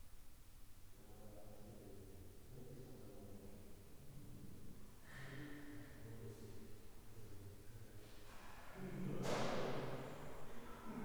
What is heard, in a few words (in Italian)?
probabile risata campione intero